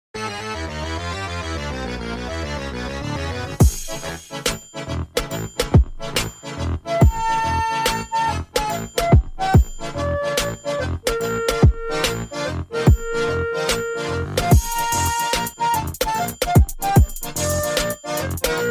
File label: trap; spider-man